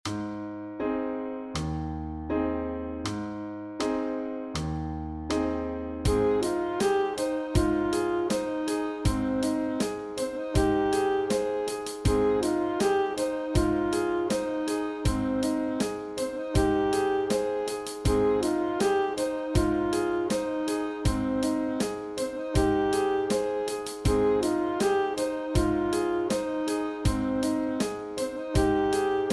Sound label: Sax Study